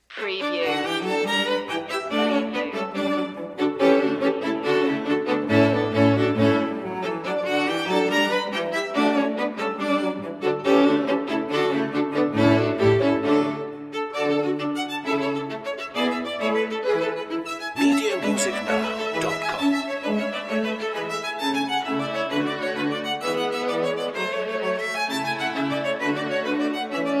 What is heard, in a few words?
string quartet no2 dmajor k155 134a mvt3 molto allegro; mozart